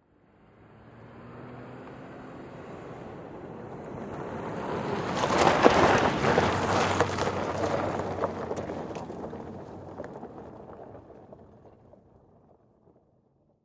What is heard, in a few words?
SUV Trk Pav To Gravel